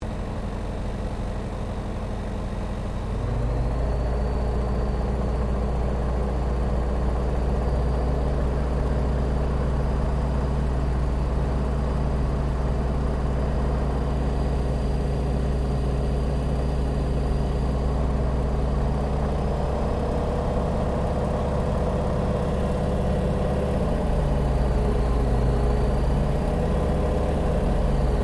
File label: Aircraft Yakovlev Yak-52 Idle Rev Interior, Russian Yak52 Training aircraft